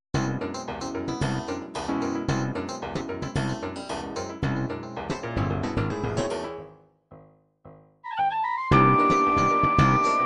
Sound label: funk fusion, midi